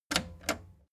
plastic button slack click slow

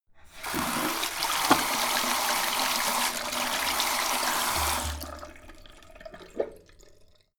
sink fast flow wash turn off on

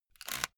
headphone extend quick

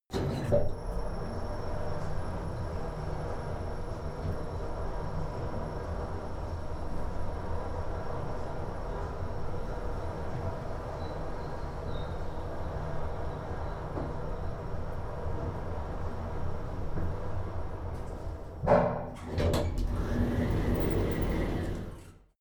elevator ride buzz high

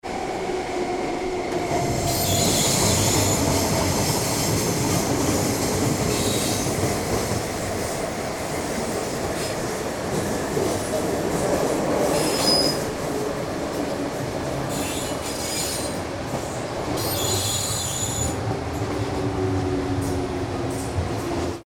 underground cabin inside

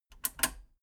metal button radio click power off fast

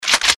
weapon clips

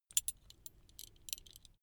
metal belt buckle clatter long soft

